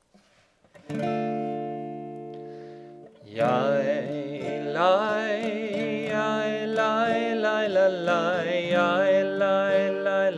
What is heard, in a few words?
Amidah Niggun High Voice; Hebrew